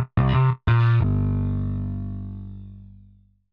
Bass; Pro Tools Essential Demos; Digidesign

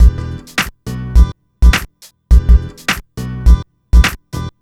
104 HipHop Summer Combo E maj; Digidesign; Pro Tools Essential Loops

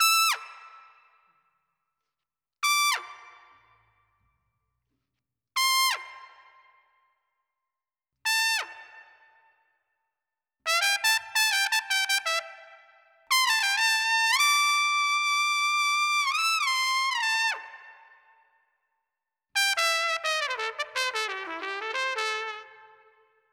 Jazz Trumpet Amin; Brass; Pro Tools Essential Loops; Digidesign

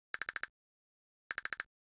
103 HipHop Castanets; Percussion; World; Digidesign; Pro Tools; Pro Tools Essential Loops

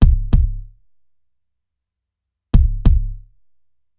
Hip Hop Kick, Drums; Pro Tools Essential Loops; Digidesign

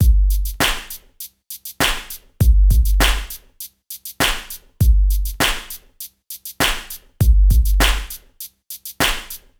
Hip Hop Beat, Drums

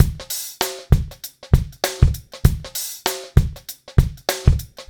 098 Pop Drums; Pop Rock; Moderate Tempo; Pro Tools Essential Loops; Digidesign

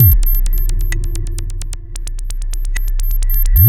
130 Dance Perc Fx; Pro Tools Essential Loops; Dance DJ; Percussion

